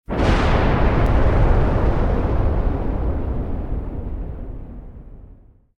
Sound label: Horror; SCP 1123